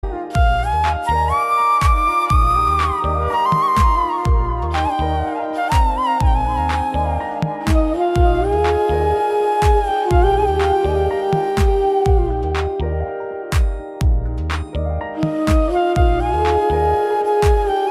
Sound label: Flute Instrumental Cover; Tamasha; Flute Siva